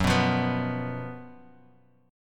Gb Major 7th Suspended 4th Gb Bass Standard; GbM7sus4; strummed; 14,14,15,16; JGuitar

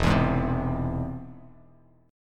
E Augmented, Bass Standard; E+ strummed; JGuitar

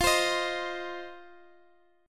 C Minor Double Flat 5th F

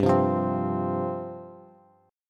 G 7th Suspended 4th G down Bass; G7sus4 strummed

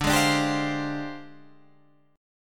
F Minor 6th Add 9th, guitar chord

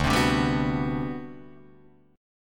D 13th D, down, Guitar, Drop D

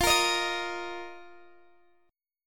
F 7th Suspended 4th F down Guitar Open D

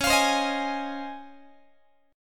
Db Major 9th, Guitar; strummed; JGuitar

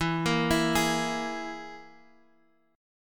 E Major Flat 5th, Guitar, Half Step Down

